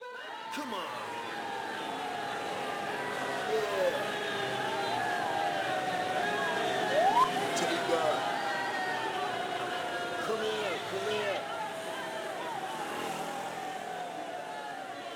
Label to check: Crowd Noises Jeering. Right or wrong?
Right